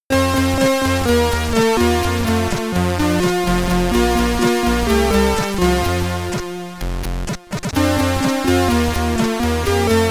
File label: High Score, SID Stereo